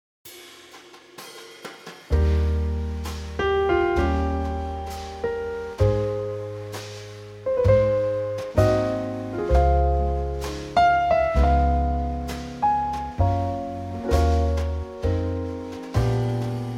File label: Eb Trio